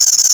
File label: tunneler worm prejump